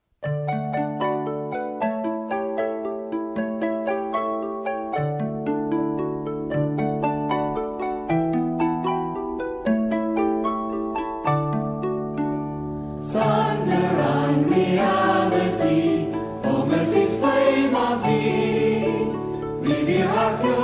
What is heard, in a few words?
devotional song